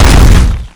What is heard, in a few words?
charger smash; cs16; zombie plague